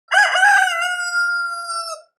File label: Rooster crowing